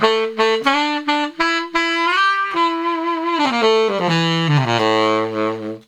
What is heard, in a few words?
sax